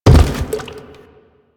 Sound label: Gas Tank Impact; Warframe; Nineteeen Ninety Nine; PvPvE